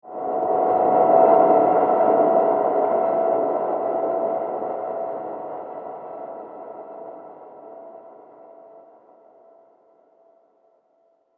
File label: Frightening Transition Terrified, horror soundscape; Scary Soundscape Sound Effects